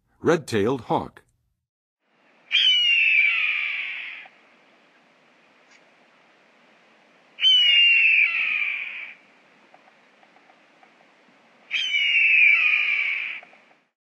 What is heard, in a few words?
Red-tailed Hawk, Birds of North America; Western USA; Western USA Songbirds